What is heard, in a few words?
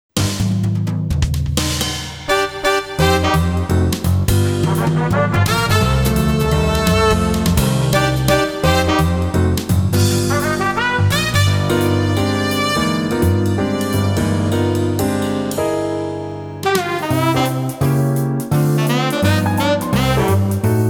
Jazzy Glass; Soul Nomad & the World Eaters